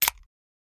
Can Soft Drink Open Qu

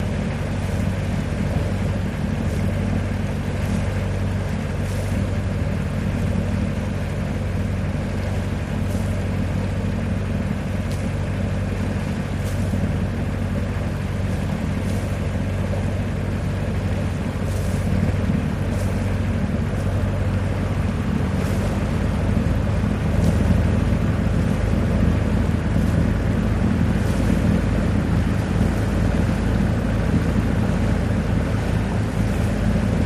Boat Exhaust Close On, exhaust

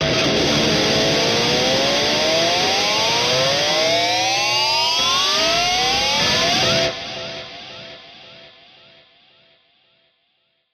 Elect Guitar Scream, electric guitar

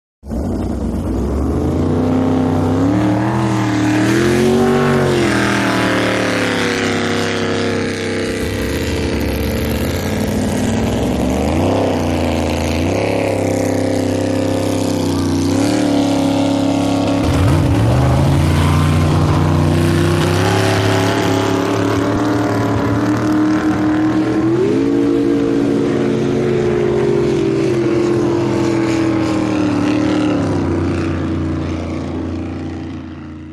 Boats In Out, motorboats

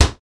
Cabinet Door Slam Di

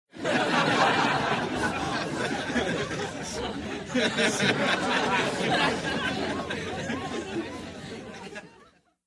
Crowd Light Laughter, medium

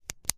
ink pen click; ink pens

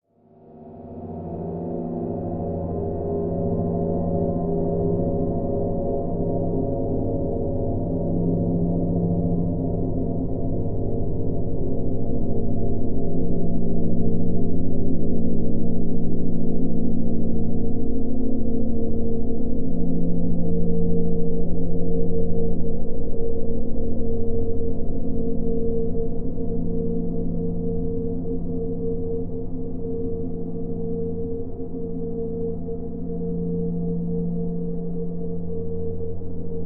Bed Ascend Mystical D

